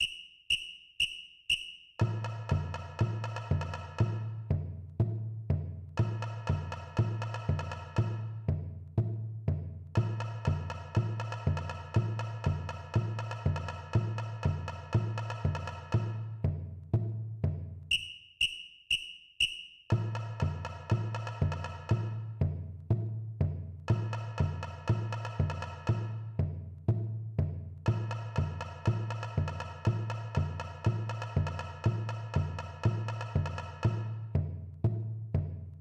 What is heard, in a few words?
new samba tam pattern